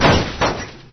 metal plate, metal plate hit

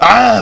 AHH

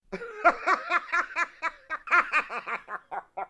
hysterical laughter, man

